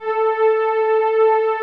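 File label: A4 ANA PAD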